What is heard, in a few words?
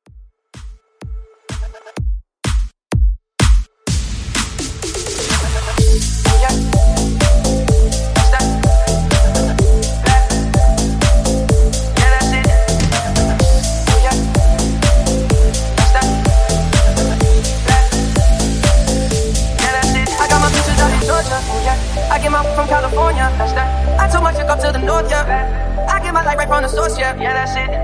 Top40 Slap House Mashup